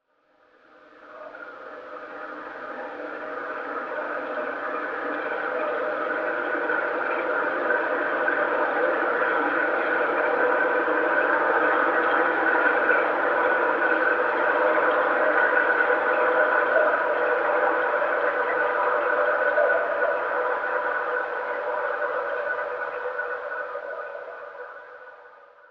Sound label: Trickle, Chalice Well